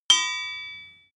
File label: sword hit ring soft